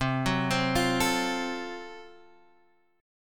Gb Minor Major 7th Flat 5th, chord

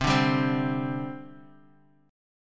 C Suspended 2nd, C, down, chord; Csus2 strummed; JGuitar

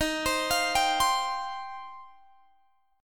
Eb 6th Add 9th Eb, Guitar Open G; x,20,22,22,20,22; arpeggiated

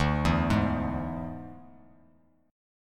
D Suspended 2nd Flat 5th D, Bass Standard; x,5,2,1; arpeggiated

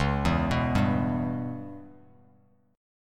Db Minor 7th Flat 5th Db down Bass Standard; arpeggiated; JGuitar

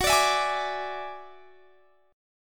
F sharp 7th Suspended 2nd Sharp 5th; F#7sus2#5 strummed

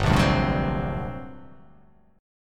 G Minor Major 11th, G; GmM11 strummed; 3,1,0,0,0,1,2,x; JGuitar

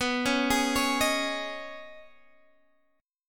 D sharp 7th Suspended 4th Sharp 5th B, Guitar

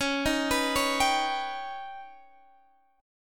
Eb Augmented 7th

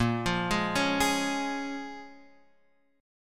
C sharp 6th, A sharp

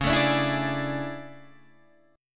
A Major 11th, guitar

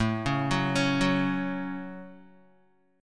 A 6th A Guitar, chord; 4,4,6,6,6,x; arpeggiated

